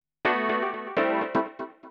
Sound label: ORGAN HOUSE 125 A; eJay; Keys